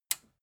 metal button tiny push enable

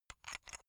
chess piece handle

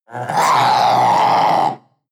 panther young roar

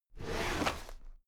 drawer cabinet paper open heavy halfway

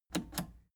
plastic button scratch smooth click disable slow

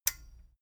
metal button stereo release disable

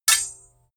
sword hit sweetener

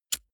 lighter wheel spin soft

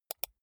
computer mouse wheel click fast soft

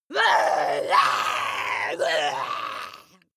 witch scream aggressive

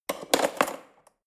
cutlery fork drawer handle scrape

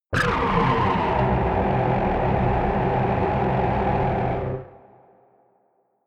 ringing ufo

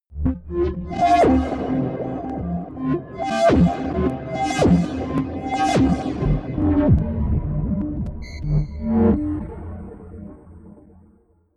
huge distortion sequence

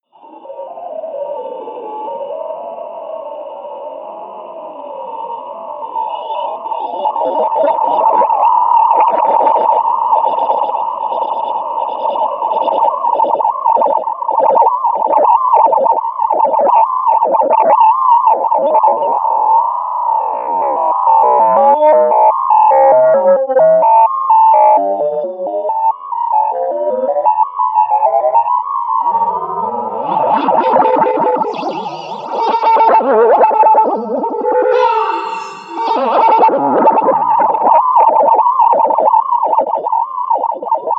atmosphere crazy whistle

